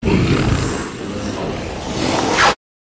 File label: Monster Combine, monsters